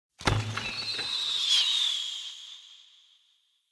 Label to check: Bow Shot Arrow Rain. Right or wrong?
Right